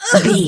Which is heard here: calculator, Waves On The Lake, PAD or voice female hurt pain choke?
voice female hurt pain choke